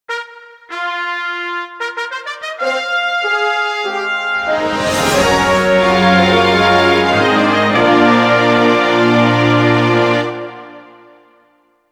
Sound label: fanfare